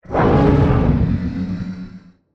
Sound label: ENDWORM attack